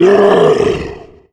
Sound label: Tank Fire; cstrike; zombie plague